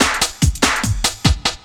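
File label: loop snare drum, 145 BPM, drum & bass; Ueberschall; Drum & Bass Flash Trackz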